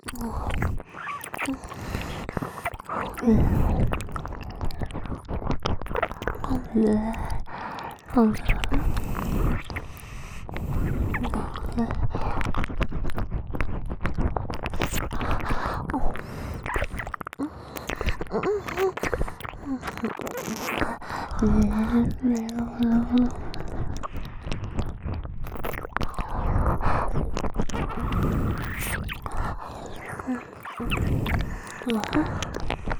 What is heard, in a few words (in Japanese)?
れろれろ両耳舐め